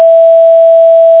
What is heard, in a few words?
sine